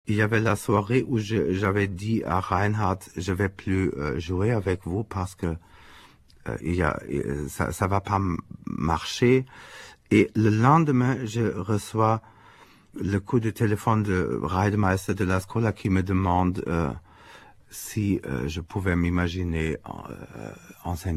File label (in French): Coup de téléphone